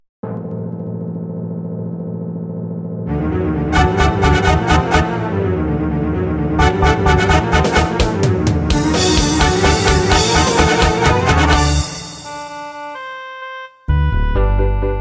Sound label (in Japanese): karaoke